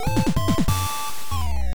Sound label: Victory; Dark Lord; Family Computer